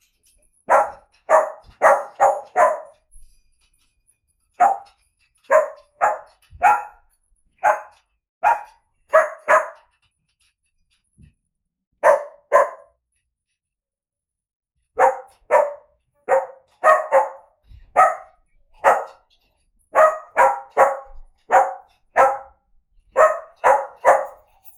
inhuman uncanny dog human, humanoid

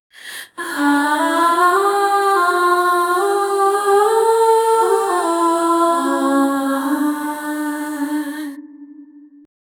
acapella only voice femal

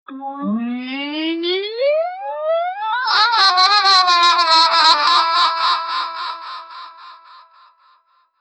nut shoot projectile

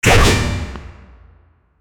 fantasy bow arrow shot

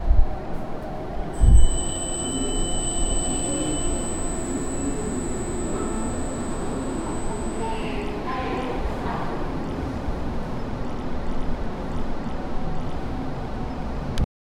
a sound for an automatic door

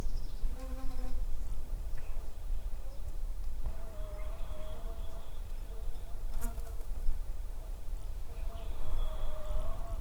sheeps bee in the, buzzing

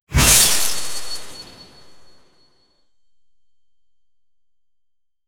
freezing bullet sniper, sniper

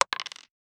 short subtle, dice

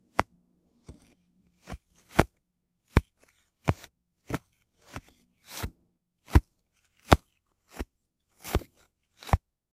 a quick close up sound, footwear

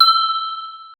soft wooden click with ge, oriental

